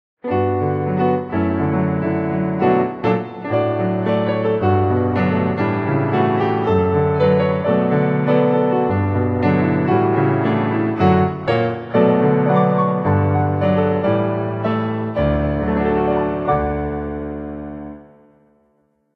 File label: piano